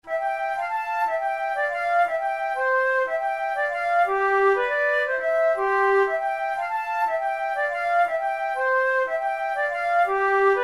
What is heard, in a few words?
waltz duet